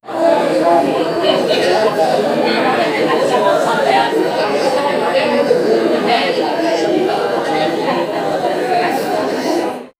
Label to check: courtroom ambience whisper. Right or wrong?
Right